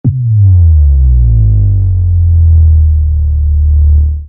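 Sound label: Power Down Subsonic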